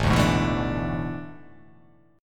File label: A Major 7th Suspended 2nd Suspended 4th